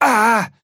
pain small; Trapper

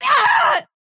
pain laser; Boho; akira

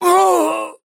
pain death; Trapper

